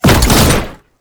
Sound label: Wall Climb Cast; Warframe; Oraxia